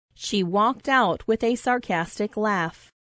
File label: laugh